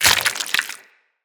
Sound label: Bleeding; Mods; Proc